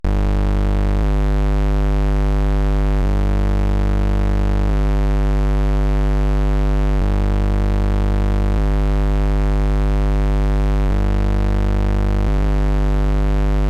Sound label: Toy Synth Dirty Deep LFO Bass Modulating Wildly, Circuit Bent Toys; Major Morgan; Submerged Tapes; Circuit Bent Toys Volume 1